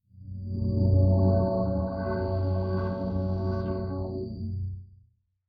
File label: Thoughtful Atmospheric Rapid Intro